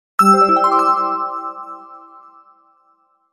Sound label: Harpa; Nokia; Windows Phone apps